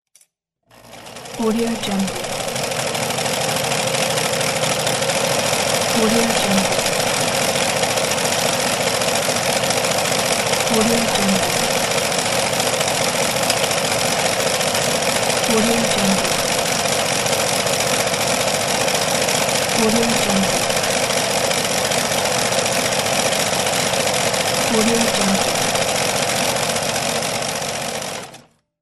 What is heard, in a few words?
sewing machine